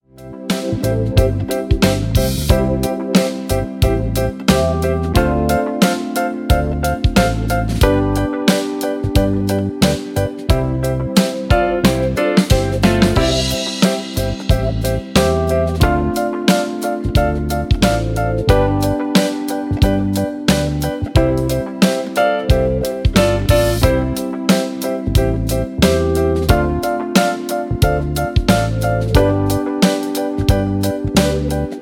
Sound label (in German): Sing mit Playback